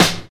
short snare drum sample f key, Royality free acoustic snare sample tuned to the F note.; snare-drum-samples